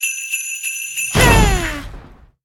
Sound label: Firecracker Santa; 烟花炮手; 祥云天宫; 烟花炮手（Firecracker）可以发射一个带有爆炸的烟花，能够破坏目标和目标后方的敌人。